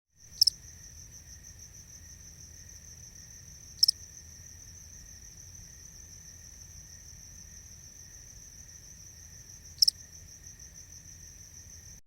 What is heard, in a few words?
single canyon cricket; Nature